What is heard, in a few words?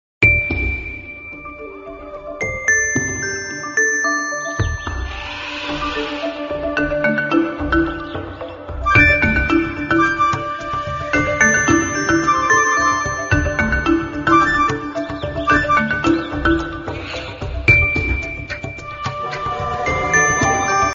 nokia ringtone